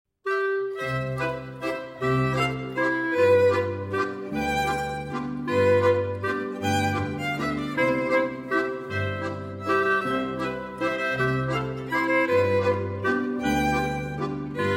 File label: instr.; Mir samma die lustigen Hammerschmiedsgselln; traditionell